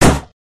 Balloon Popping

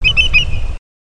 Greater Yellowlegs Call

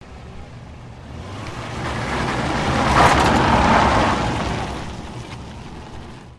Car Loosing Control

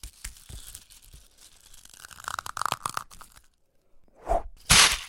Snow Ball

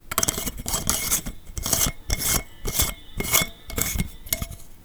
screw in lightbulb; mike koenig

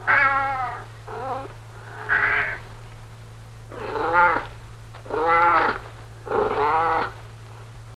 6 Cat Meows; Mr Smith

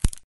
Branch Break

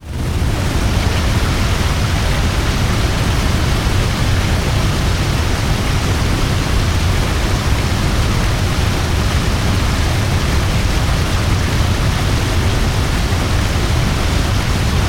large waterfall; daniel simon

